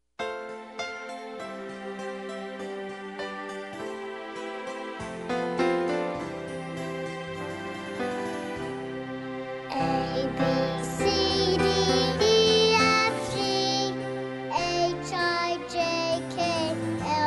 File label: Alphabet Song